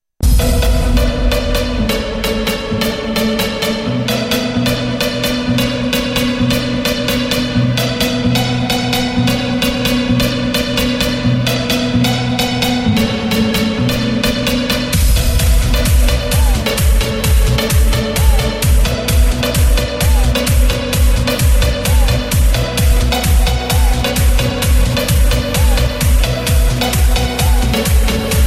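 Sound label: Cool Trance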